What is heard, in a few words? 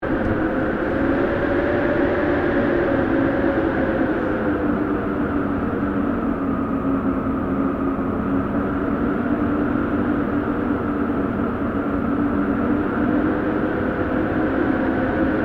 Wind Howling Loop; Elemental weather effects; Wind (Sound Effects:Weather)